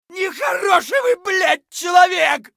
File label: scav wound; eft